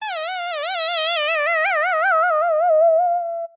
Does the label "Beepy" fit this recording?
No